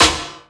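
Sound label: snare drum, Drum & Bass; Ueberschall; Drum & Bass Resonance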